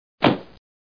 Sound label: parachute; DR BRAIN; BUGS